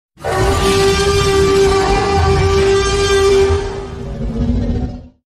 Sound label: Tyrannosaurus Rex